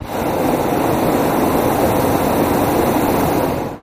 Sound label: Hot Air Balloon Burner, Medium Hard On, Off, Long